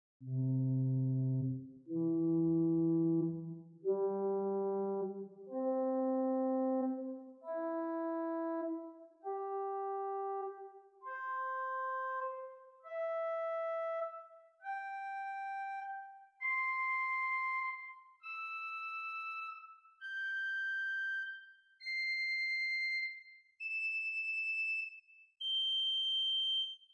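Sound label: Swell Gemshorn arpeggio, organ; Prudhoe Methodist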